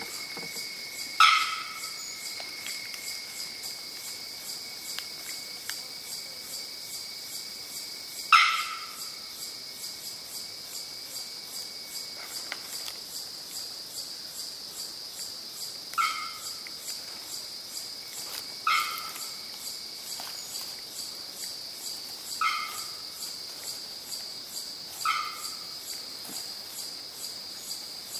bearded bellbird

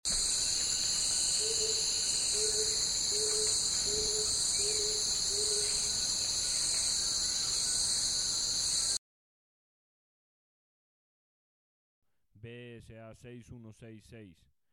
gilded barbet

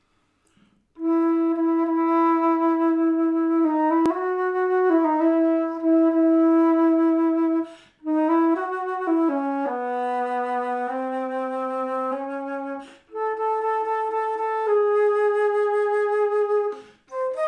Alto flute; فلوت آلتو

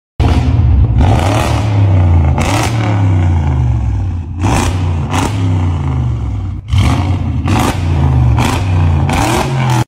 Audi exhaust

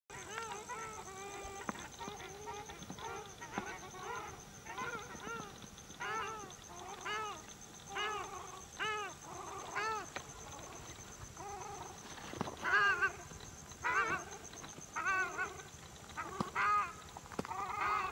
Crested shelduck; پرنده تنجه کاکلی

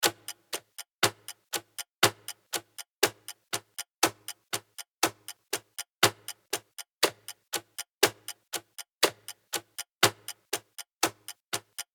clock ticking 60 second countdown

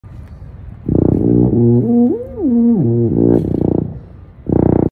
Wagner tuba

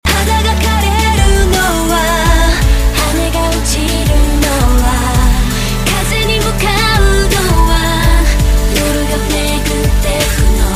Japanese ringtone

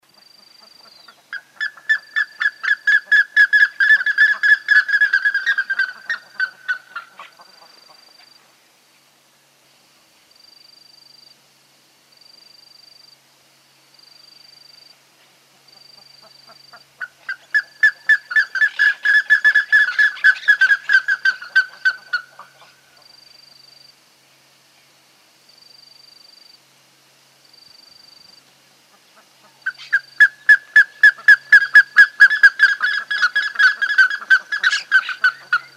Plumbeous ibis; پرنده اکراس سربی

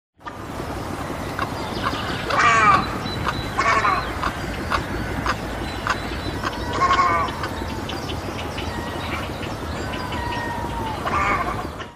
Chinese egret

